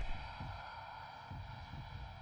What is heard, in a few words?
breath; Rumbles